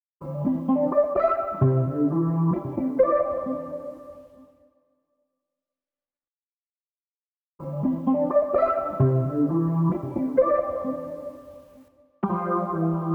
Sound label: 130 BPM A Min (OB6+P6) Guitar Lead; Melodies; Loop Stems; Cymatics; Osiris